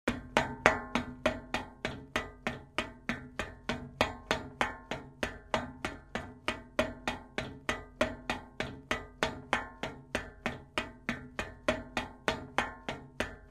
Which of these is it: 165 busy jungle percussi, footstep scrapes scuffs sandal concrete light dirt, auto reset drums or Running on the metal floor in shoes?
Running on the metal floor in shoes